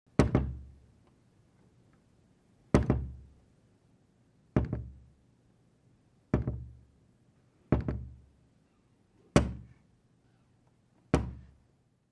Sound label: Door Bump Frame Mediu, knocks